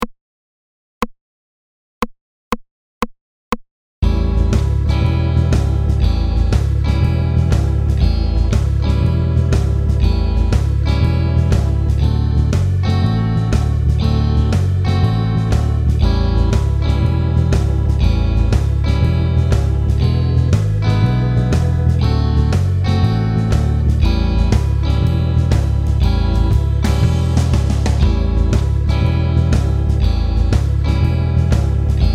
rythmique 2 120

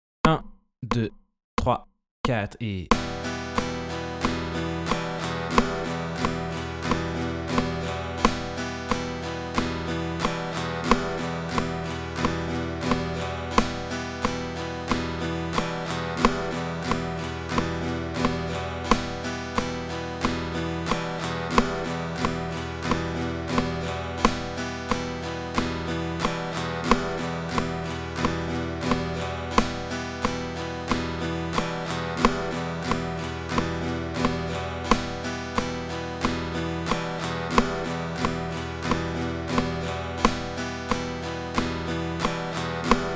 Flèches vers le bas et le haut

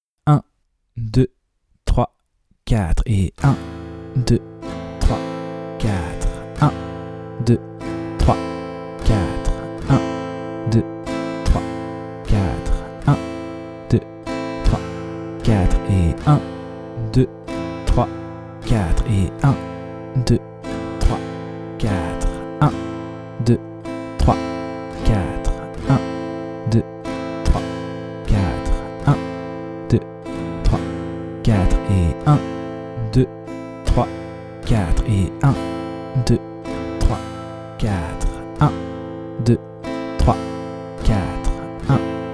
moyen travailler la rythmique; De 0 à vos premières suites d'accords

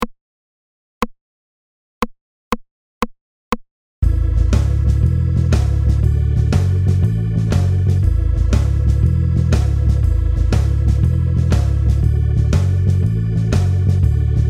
backing majeur, 120

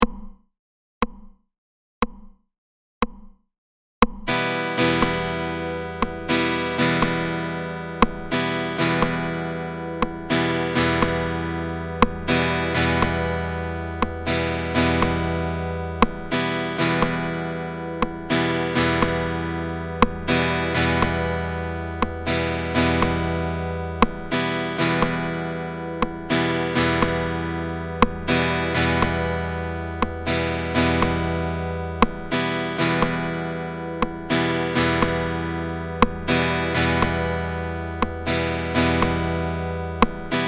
Deux flèches vers le haut

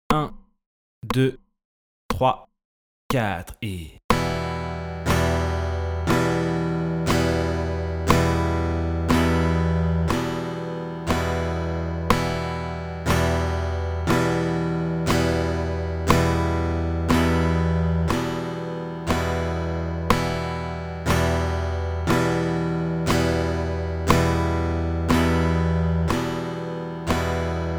Flèches vers le bas 150

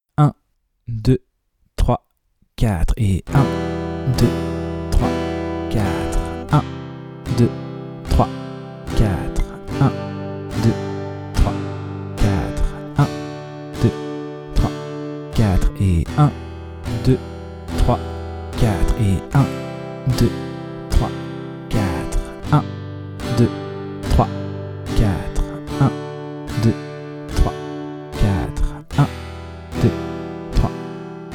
moyen travailler la nouvelle grille avec la première rythmique; De 0 à vos premières suites d'accords

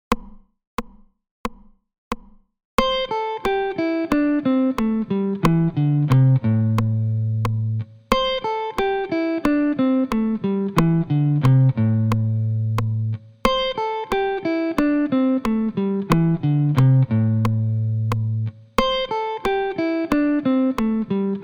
Vers le grave; objectif solo 15 jours pour apprendre a improviser

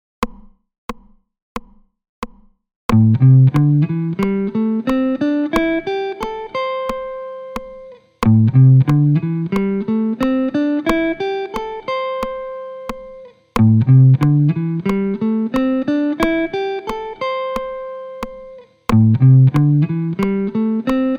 Vers l'aiguë; objectif solo 15 jours pour apprendre a improviser

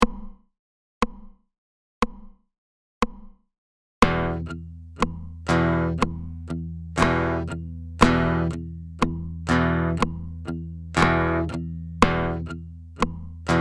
Accents sur rythmique rock 60

